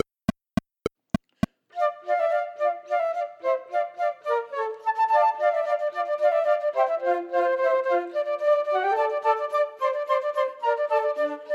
Quartet minus 1st part; Dvorak

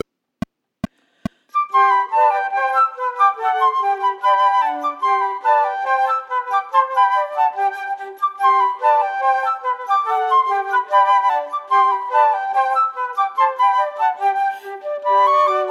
Allegro Assai Quartet minus 3rd part; Haydn

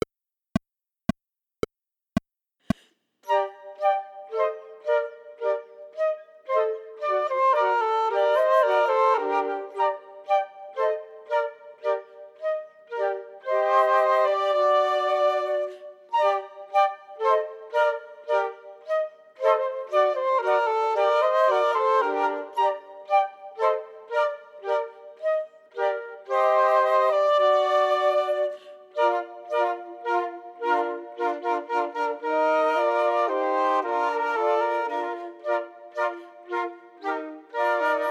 Minuet minus 1st part; Scarlatti

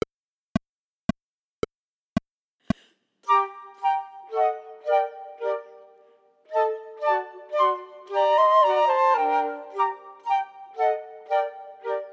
Minuet minus 3rd part; Scarlatti

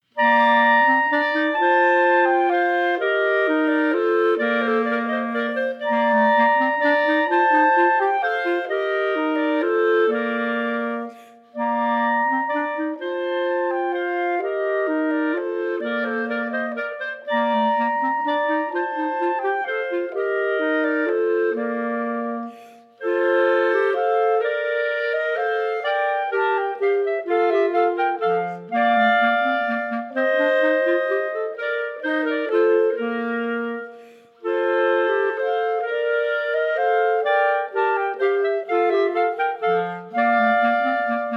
Minuet, Chamber Music for 3 Clarinets; Partita in F; Chamber Music for 3 Clarinets Vol I

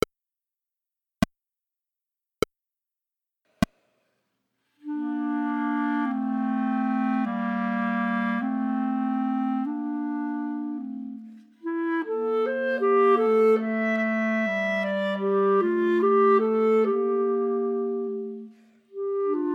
3 Bb Clarinets minus 1st part

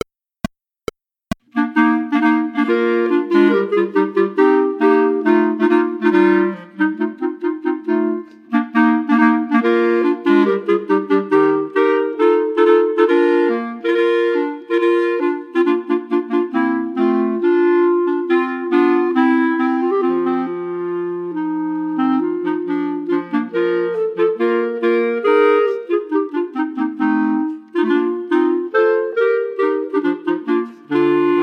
Equale Ensemble minus 1st part; Mendelssohn

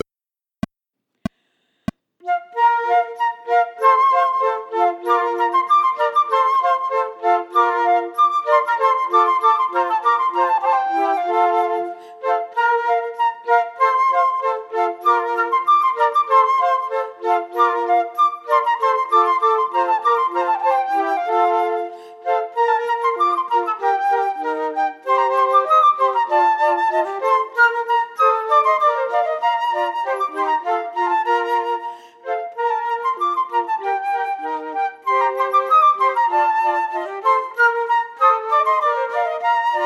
Gavotta minus 2nd part; Corelli

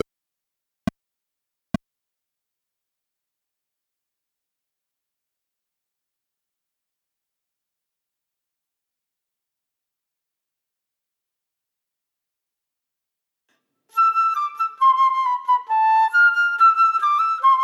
Quartet minus 1st part; Rimsky Korsakoff

